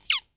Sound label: hit, monsters ratto